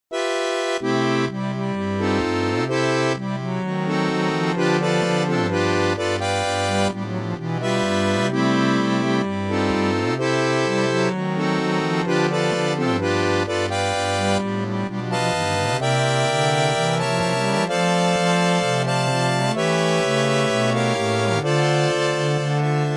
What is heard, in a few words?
harmonica; Narcissus; Yerxa; Synthesized audio for study